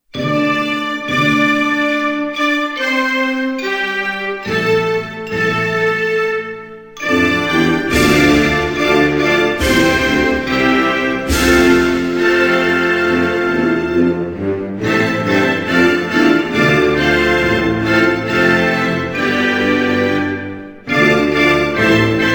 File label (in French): Kenya, Hymnes nationaux